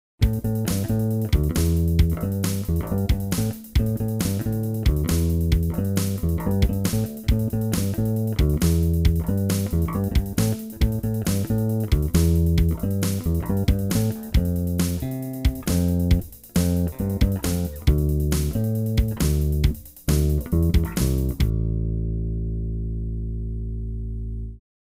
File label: Harley Benton JB-62CC Bridge Pickup only